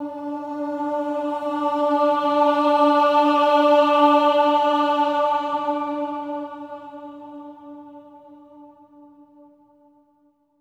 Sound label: AH D4, swell ahs, Symphony of Voices; Spectrasonics